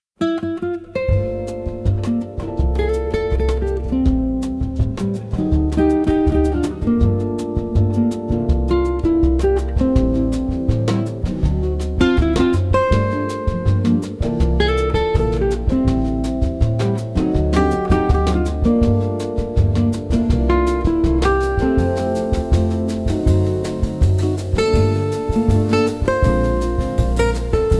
bossa nova acoustic for bright afternoon

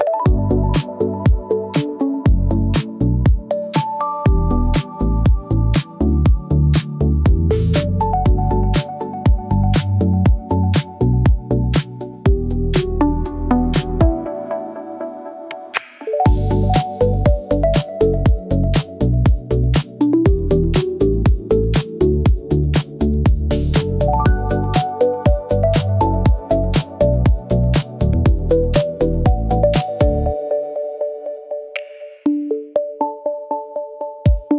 Soft Brew Loop, soft electro; for scruffy modern vlogs

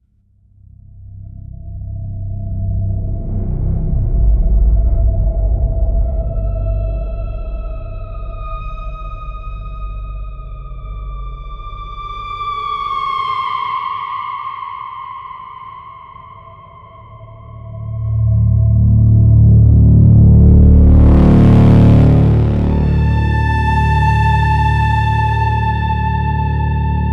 spiritual spooky soundscapes

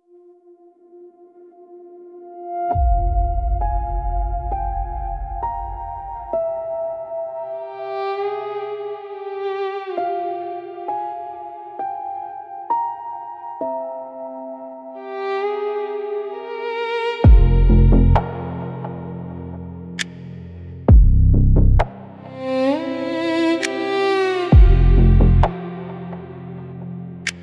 spiritual spooky soundscapes

